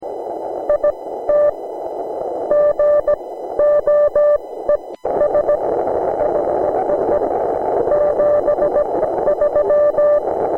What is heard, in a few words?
K9AY 40mtrs CW